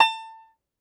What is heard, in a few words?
Tenor Banjo, Plucked Strings; E-MU Producer Series Vol. 7 – Old World Instruments